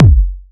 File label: rich mid range bass drum sample F sharp key